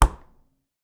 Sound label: Household Freezer Door Closing